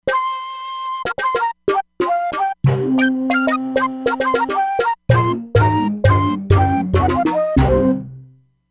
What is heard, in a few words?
Uduhachi, E-mu Proteus-3; Factory patches